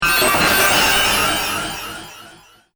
Droid Data Motion, robot; Robot Sound Effects

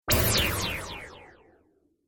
Laser Swish Delay, whoosh sound effect

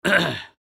Human Cough; Cough Clear Throat Short Male Old; Sick; Blastwave

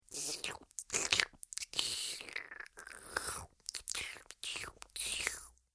Vampire Slurping Blood; Monster Sound Effects; Horror Sound Effect

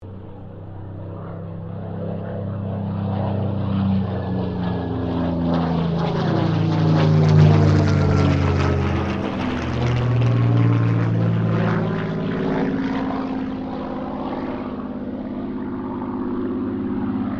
AIRCRAFT SUPERMARINE SPITFIRE MK-XVI LOOP; Airplane Sound Effects; Vehicles

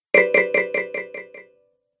App Delay, ping; multimedia

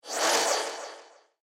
Missile Fly By; Cannon Sound Effects; war; science fiction sound effect

